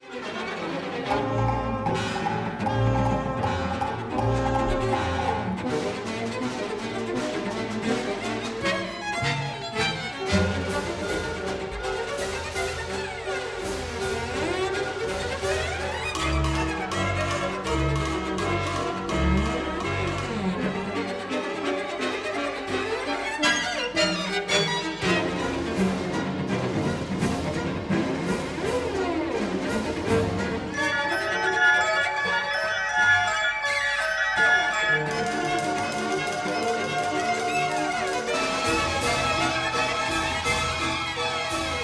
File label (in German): Cellosolo, Orchester; Cerha; Konzert fuer Violine, Violoncello und Orchester